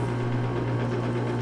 recycler grind

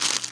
joint fold

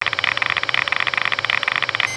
jammer loop

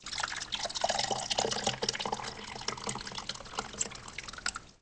fill liquid

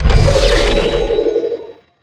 pickup

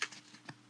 paper open

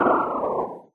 slowdown

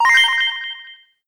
win

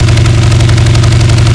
vtwin large, engines; acf engines

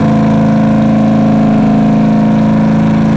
v8 petrol, engines; acf engines

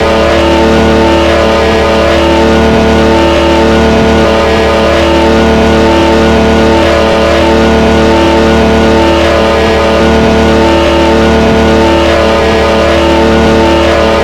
v12 petrol medium, engines; acf engines

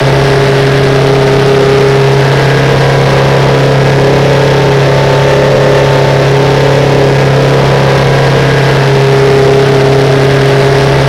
i4 petrol medium, engines; acf engines

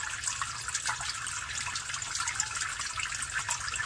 manhole water

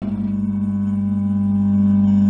lamp sodium start

